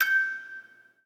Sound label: musicbox